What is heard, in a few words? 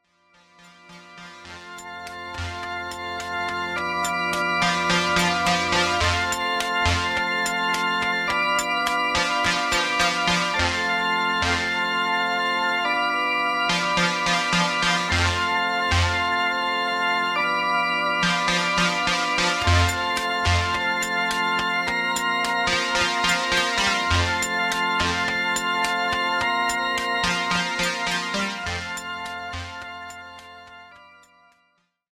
Bass Flanger